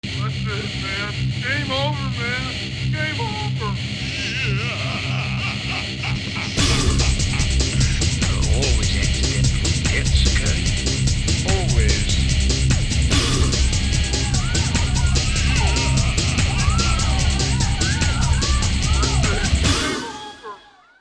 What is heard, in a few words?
GAME OVER; FLESH FARM; STEEL MACHINE; CDi GAME SOUNDTRACK; SPAZ